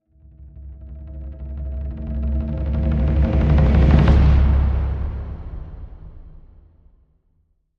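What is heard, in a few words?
Light Taiko Crescendo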